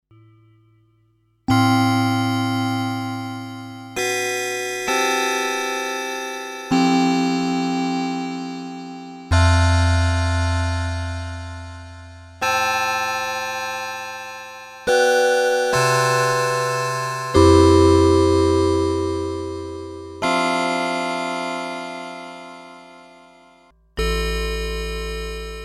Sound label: ASR 10 Transwaves random